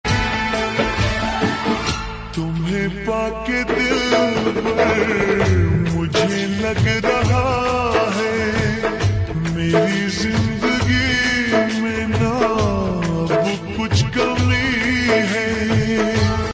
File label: Ringtone